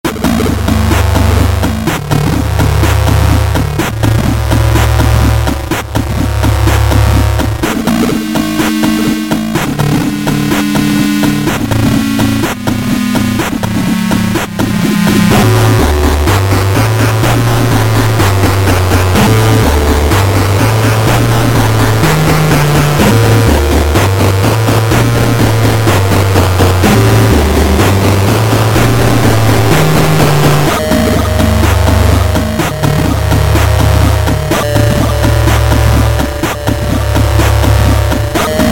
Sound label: chip